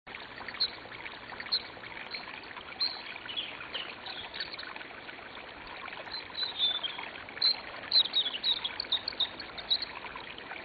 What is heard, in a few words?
brook and bird